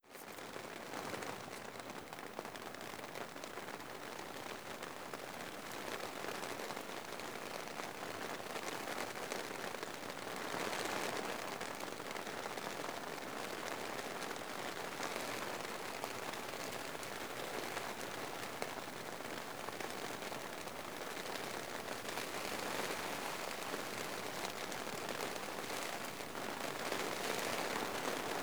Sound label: Rain On The Tent